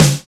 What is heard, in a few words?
SNR XC SNR; Club-50 - Foundations Roland; Cheez Snrs